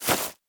根 踩踏声

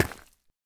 深板岩破坏声

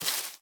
樱花 树叶 踩踏声

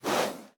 熊猫鼻息声

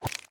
浮漂收回声

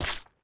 树脂 破坏声

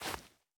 细雪破坏声

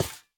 刷怪笼踩踏声

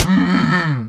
骆驼死亡声

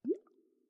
水下气泡声; ambient

